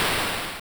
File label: hit